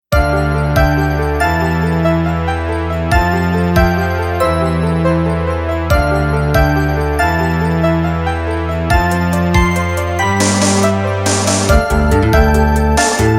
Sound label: cross-examination - allegro